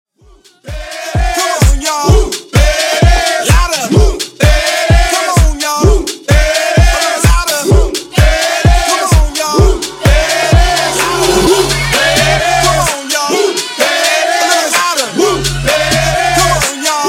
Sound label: Bootleg House 128bpm